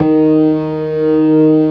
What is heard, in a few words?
ORGAN COMBOS; Keith Emerson Signature Series